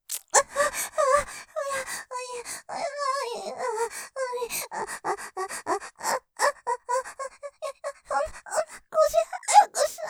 喘ぎ キスしながら→ふやふやセックス; ステレオ; ループで楽しむ！シェフの気まぐれ抜き抜きコース

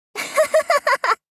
あはははははっ！少女×中音域

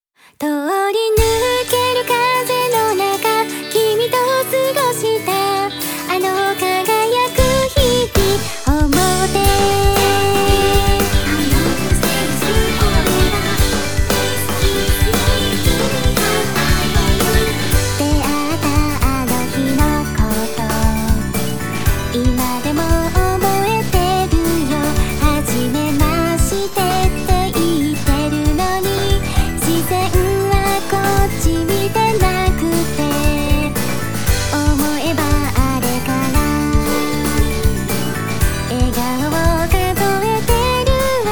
キャラクターソング; 純夏編

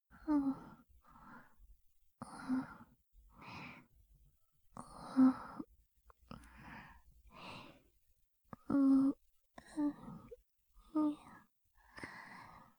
寝息・寝言入り

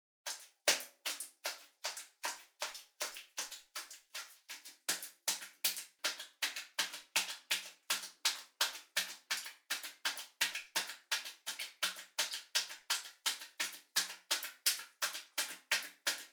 ピストン 高速; 3DIO; アダルトな音

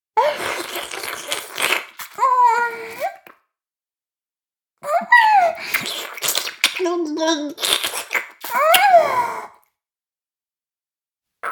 フェラ音声; おにいちゃんアイスぅー！; 妹

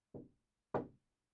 ノック

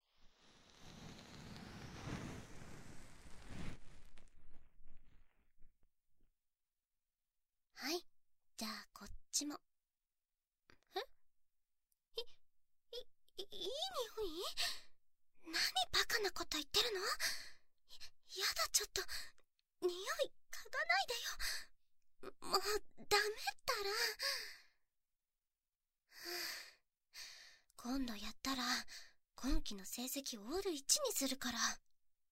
左 耳かき パート

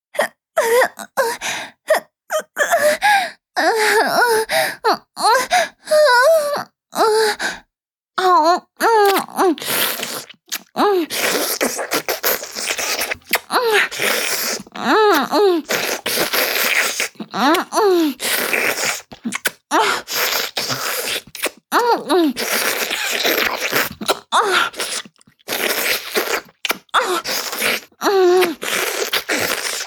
シックスナイン(中):男の体に載って陰茎をしゃぶる, チュパ音; チュパ音抜粋集

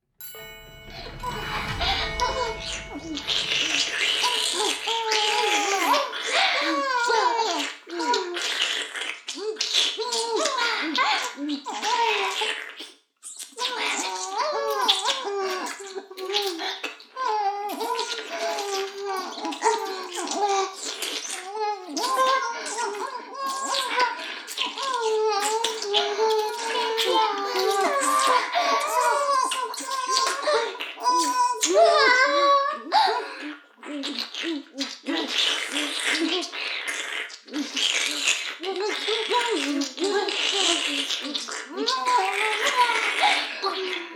停止解除フェラ音声; 逆時間停止されてストーカー後輩に

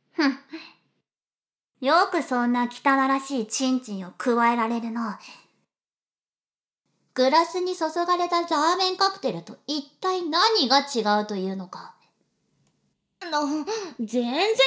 お口で…ぐっぽぐっぽ♪ニュップにゅっぷ～♪; LR逆ver; 牧場戦腺

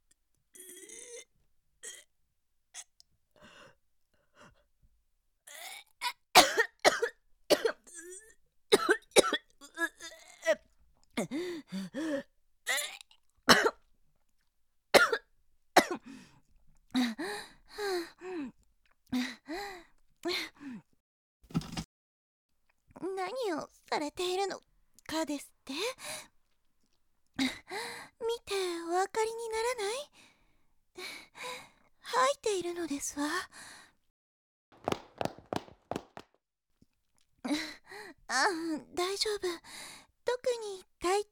嘔吐ダイジェスト 嘔吐実演＆生音 効果音入り

